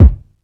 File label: studio steel kick drum c key